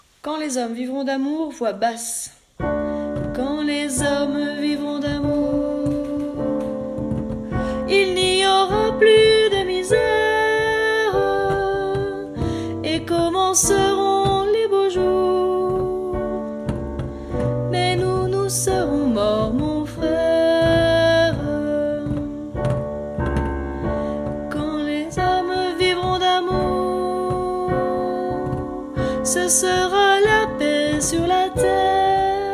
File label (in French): Basse